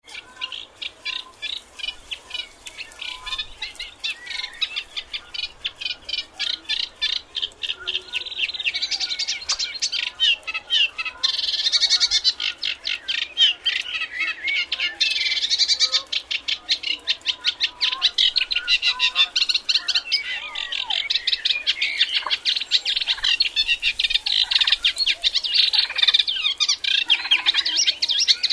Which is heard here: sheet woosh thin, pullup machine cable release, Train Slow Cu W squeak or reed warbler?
reed warbler